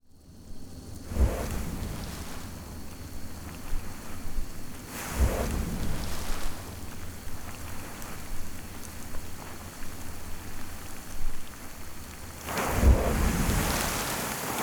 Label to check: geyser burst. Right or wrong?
Right